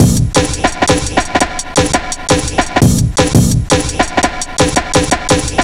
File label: 170, drum loops, drum & bass